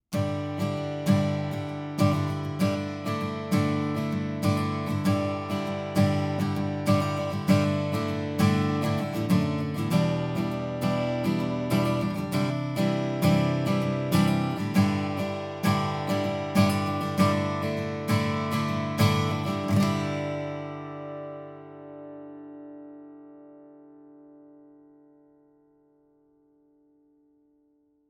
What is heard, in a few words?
strummed; Baton Rouge